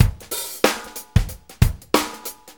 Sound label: shuffle loop